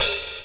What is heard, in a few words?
OPEN HH, drums; Big Fish Audio; Synth City